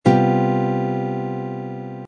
c major seventh suspended fourth chord voicing, guitar